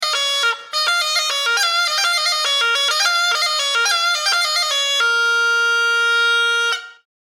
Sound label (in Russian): rozhok